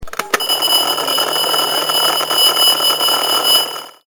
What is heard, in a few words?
Mechanical